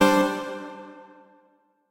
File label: Tessellation Clear F1